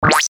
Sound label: syntetic zap